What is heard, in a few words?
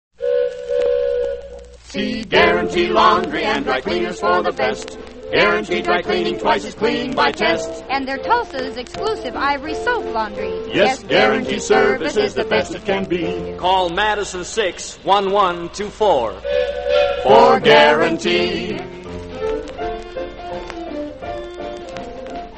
radio jingles; guarantee laundry; allied radio artists